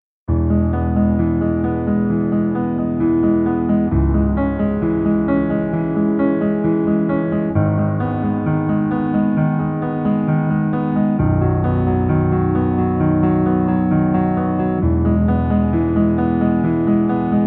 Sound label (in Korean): Piano ver 멜로디MR -1키